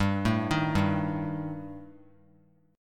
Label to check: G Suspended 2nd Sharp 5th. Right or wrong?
Right